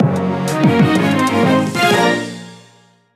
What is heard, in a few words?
Fanfare; Steel Diver Sub Wars; 3ds; Expert Open